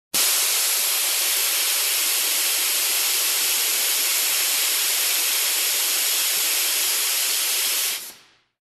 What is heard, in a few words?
Air Pressure Noise